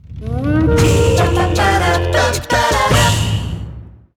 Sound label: Goal Fanfare; crash bandicoot series sound box; Fossil Fuel Injection